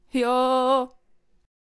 Celestial Aegis Attack Vocals

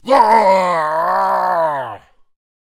Death Vocals

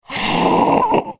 Witch Attack Vocals

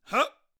Teacher Attack Vocals

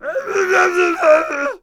Ballista Attack Vocals

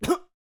Boxer Death Vocals

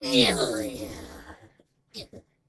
Death Vocals; Banshee

